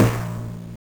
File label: Timpani; Sonic 2; M8; Genesis